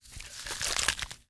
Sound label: gather meat